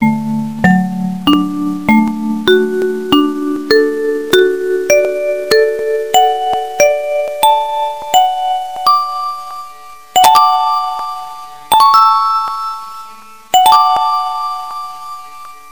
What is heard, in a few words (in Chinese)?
上课正式, 铃声; 小学; 广播室铃声系统